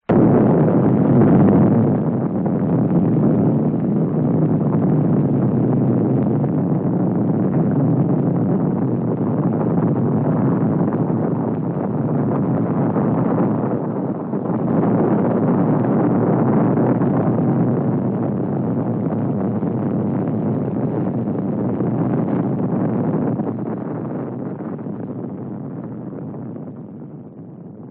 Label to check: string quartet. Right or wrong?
Wrong